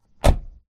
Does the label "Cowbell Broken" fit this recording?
No